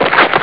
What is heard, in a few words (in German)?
fall hin; Amiga Soundtracker sample